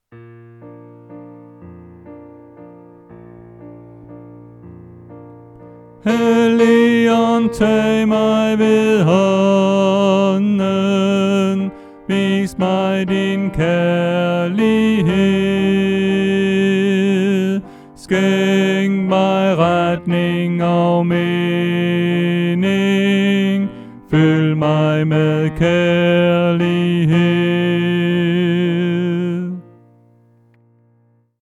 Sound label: Tenor